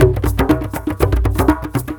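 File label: 120 COMBO, drum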